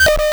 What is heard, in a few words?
sounds negative